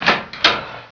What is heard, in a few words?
open door